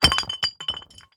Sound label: jade statue fall